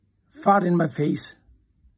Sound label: fart in my face